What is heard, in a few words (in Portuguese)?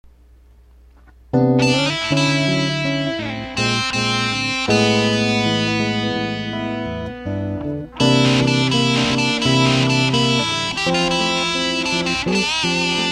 choro